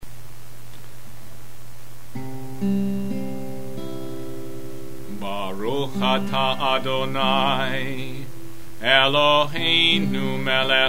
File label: kiddush